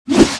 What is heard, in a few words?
ATTACK